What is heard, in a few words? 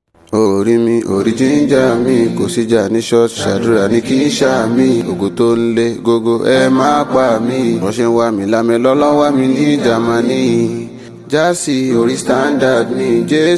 Acapella Cover